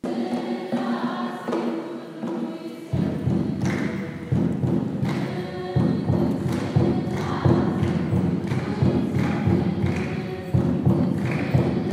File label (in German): Gesang eritreeische Gemeinde; Wie sieht Vielfalt an rel Orten aus